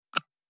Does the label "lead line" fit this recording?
No